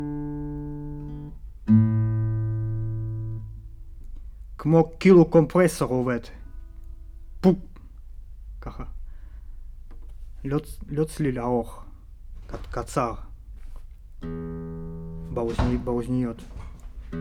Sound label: Tape GTR